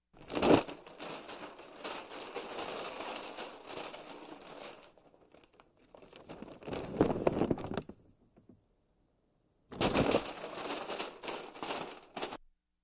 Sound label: Paper Bag Remove From Head